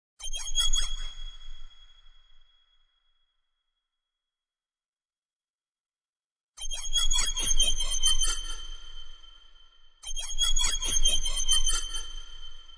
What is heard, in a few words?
Crpy High, Creepy high-pitched background sound excellent for evoking fright and fe; Halloween Sound Effects